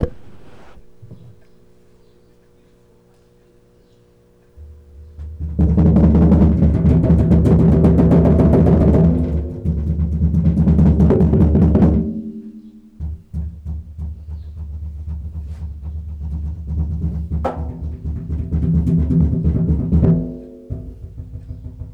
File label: crazy u drums